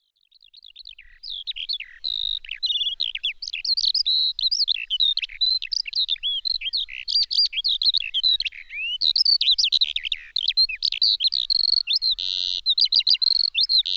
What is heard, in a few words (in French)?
oiseaux campagne; Ombres du passé